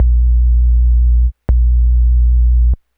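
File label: bass, Hardcore 161 B minor; Big Fish Audio; Hardcore Breakbeat Action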